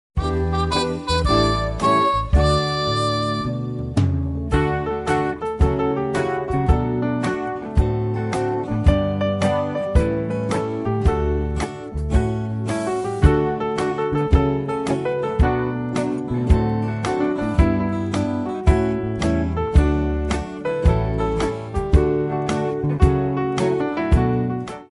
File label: instrumental